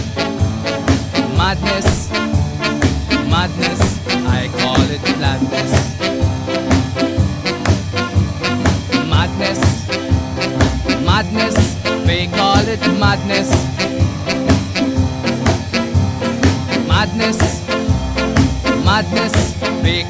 reggae

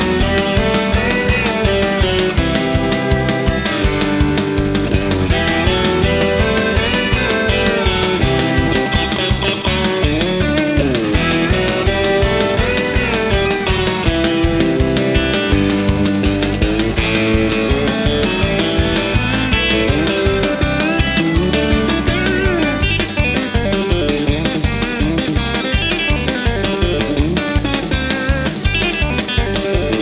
country

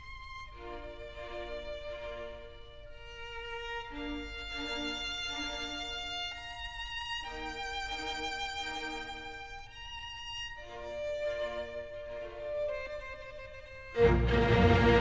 classical

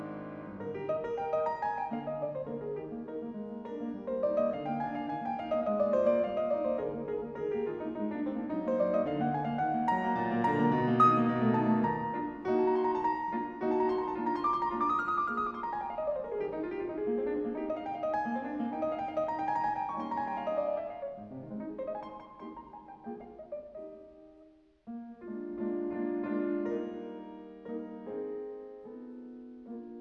classical

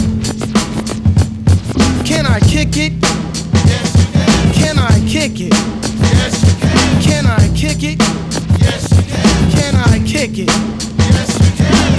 hiphop

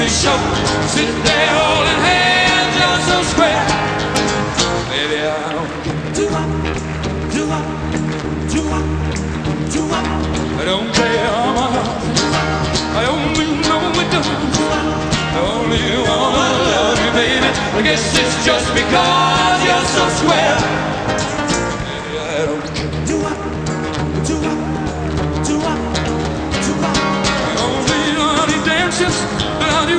rock

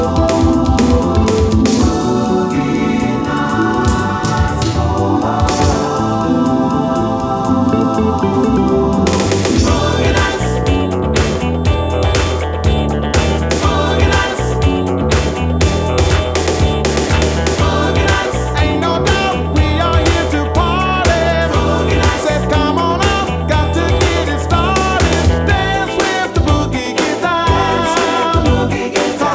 disco

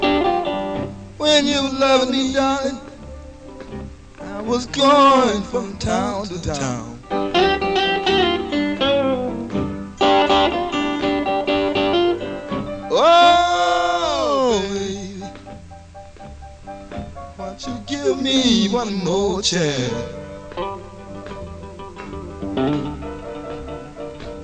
blues

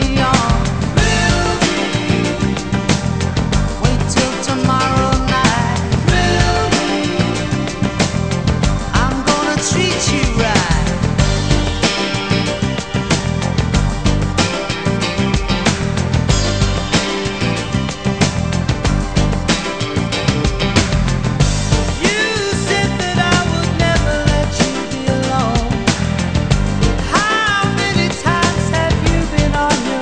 rock

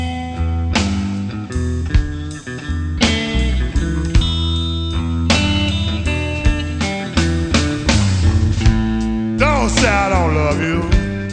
blues

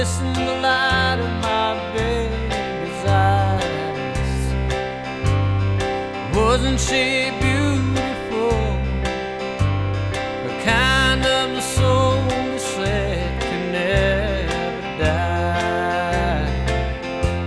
country

